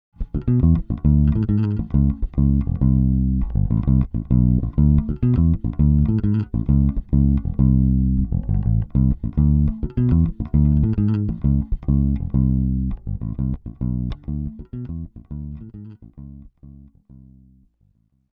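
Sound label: Clean unprocessed; fender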